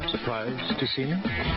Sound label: Surprised